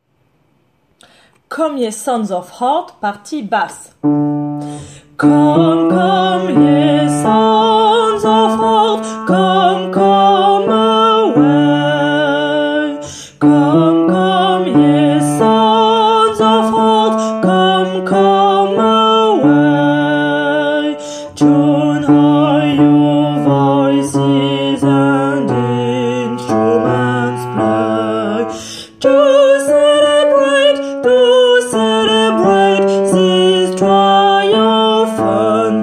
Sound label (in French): basse; Come ye sons of art